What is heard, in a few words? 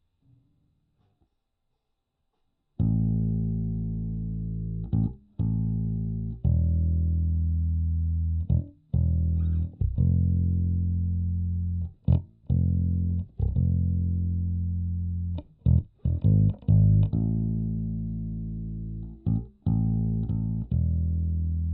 Bass Amp